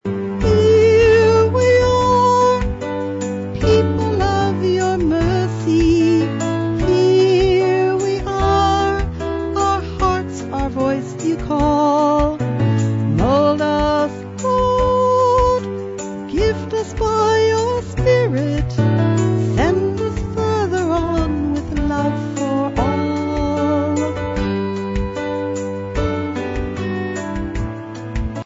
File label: Here we are VOCAL